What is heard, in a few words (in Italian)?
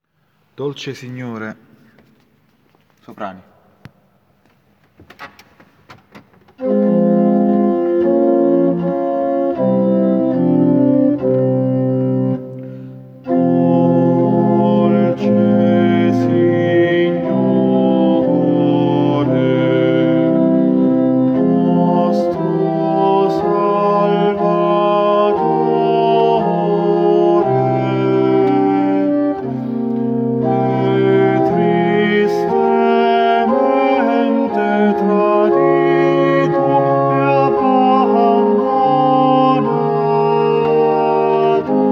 Dolce Signore SOPRAN